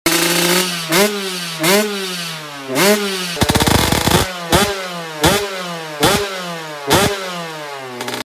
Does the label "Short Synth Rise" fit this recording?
No